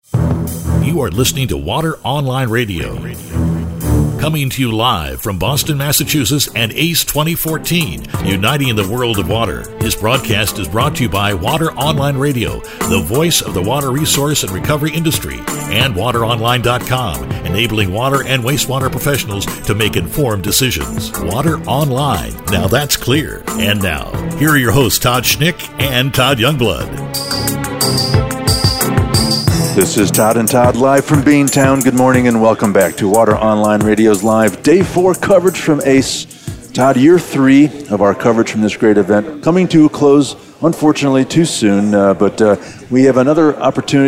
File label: horn